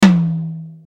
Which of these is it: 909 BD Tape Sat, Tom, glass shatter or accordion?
Tom